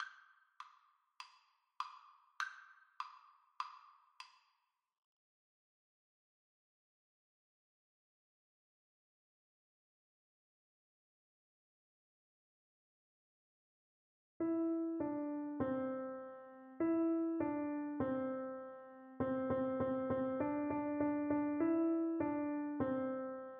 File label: Piano Duet